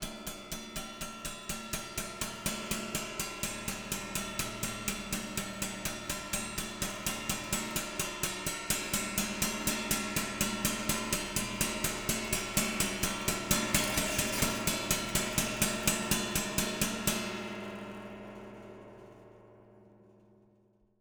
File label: Zither Hand Hits